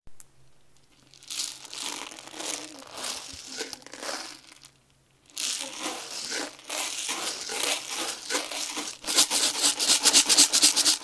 Beads

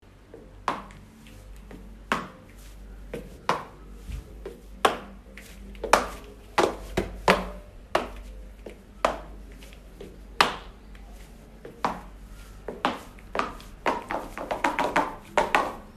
Heels

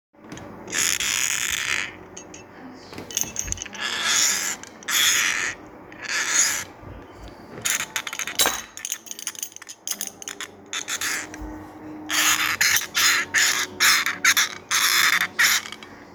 Hand Gripper

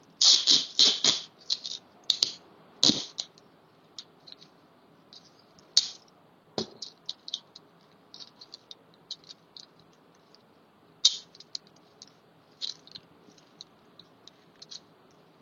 Tape

